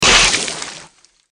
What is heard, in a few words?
Seltzer squirt hit